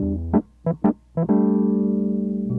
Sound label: wurly, analog funk; Mixed Berry Jam; Sonic Foundry (Sony Creative Software) - What it Is! 70s Analog Funk